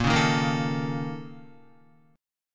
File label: Bb Minor Major 7th Double Flat 5th, Guitar; BbmM7bb5; strummed; JGuitar